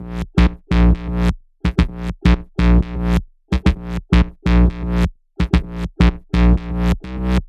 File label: Melody 2, 128BPM; Vengeance Samples; Pee Dee Kit